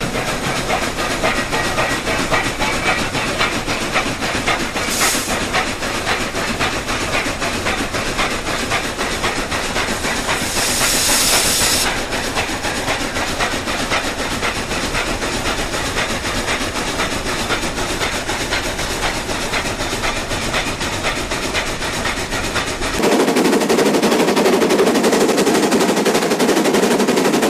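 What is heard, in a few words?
Steam Loco Start Slow By, steam train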